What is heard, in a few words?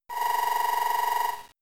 Signal Beam